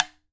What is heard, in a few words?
OKAWA, JAPAN PERC; AKAI S6000 CD-ROM; WorldSounds